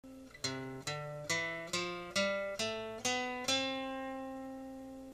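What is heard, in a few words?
C major scale, guitar; guitar fundamentals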